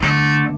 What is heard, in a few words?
orchestra string hit D